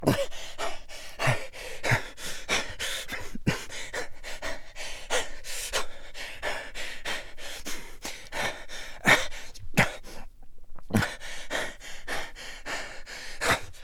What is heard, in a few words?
Foley Frantic Breathing; the 39 steps